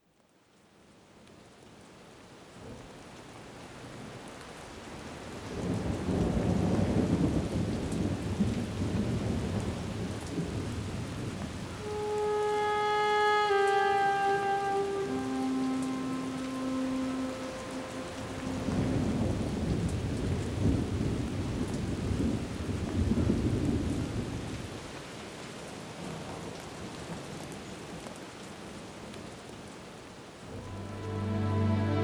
rain sound light soft saxophone then creepy

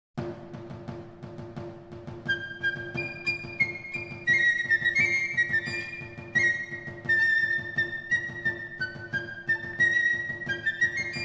flute and choir classic piece

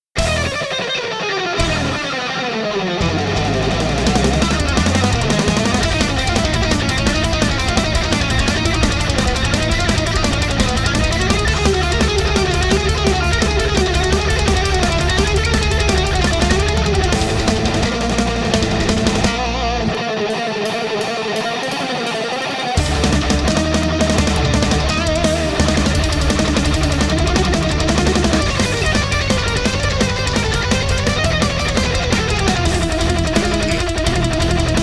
FLIGHT OF THE BUMBLEBEE GUITAR

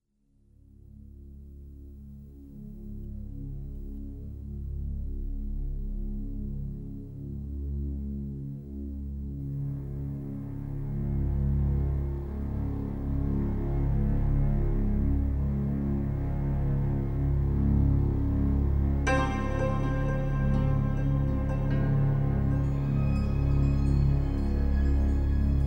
eastern European sound

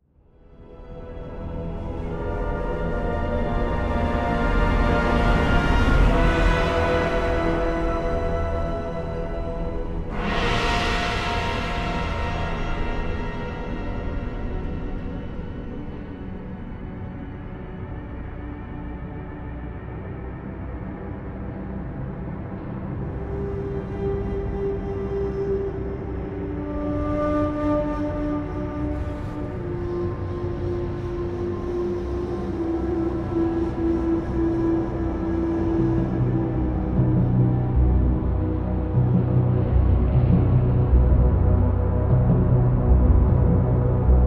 Music Ambience; Hellfire Peninsula; World of Warcraft

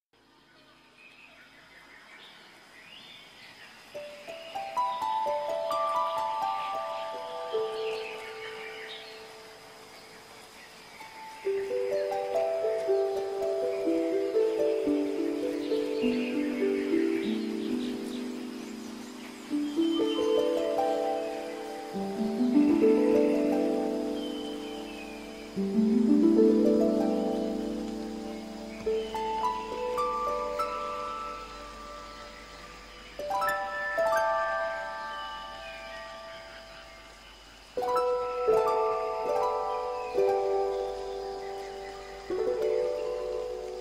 Waterfall

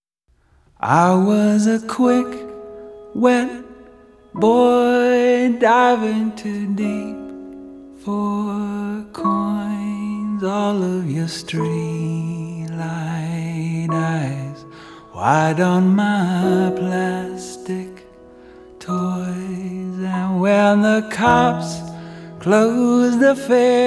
wedding version

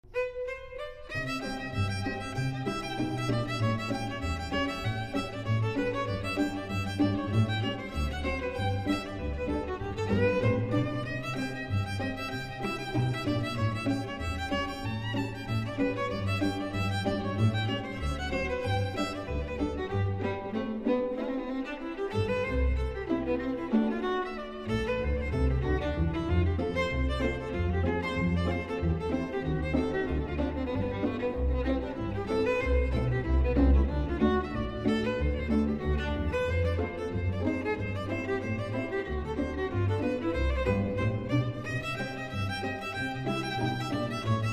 Strings Pickin Parlor Rag

